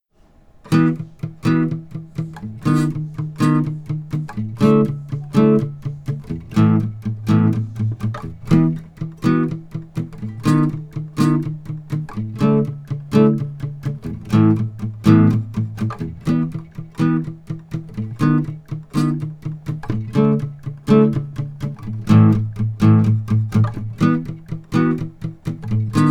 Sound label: click track exercise rendered